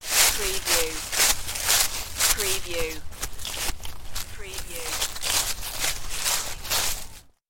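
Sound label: Footsteps Leaves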